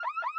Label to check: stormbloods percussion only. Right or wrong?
Wrong